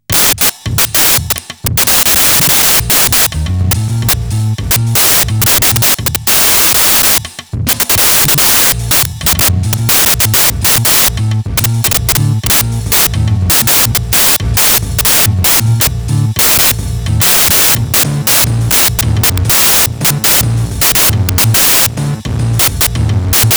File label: techno